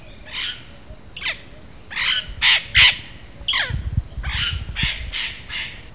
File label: amazona albifrons